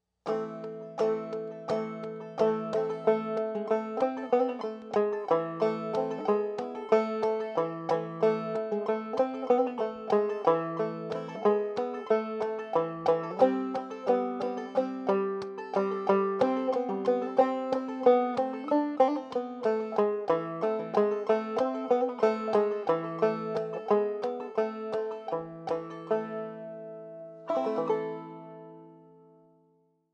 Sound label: fast; Christmas